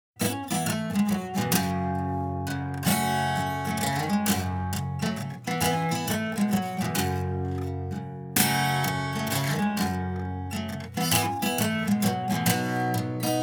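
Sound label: ultramarine acoustic guitar enabled; ultramarine3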